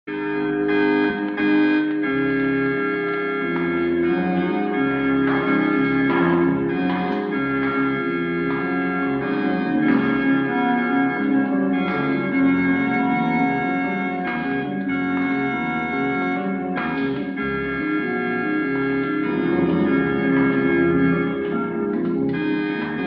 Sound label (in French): orgue